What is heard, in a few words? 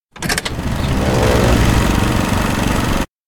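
electronic plane climb; anemoi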